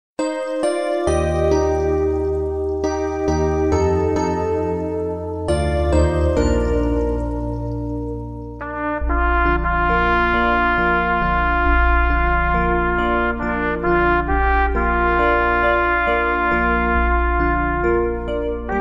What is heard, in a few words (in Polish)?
maria sliczna pani 1 trabka